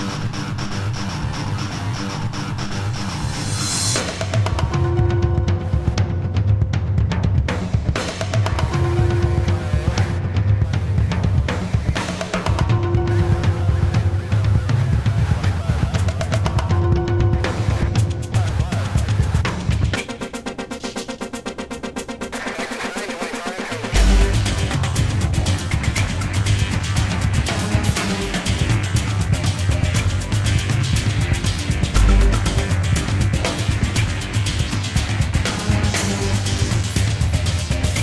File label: Intense Loop; True Crime New York City; Xbox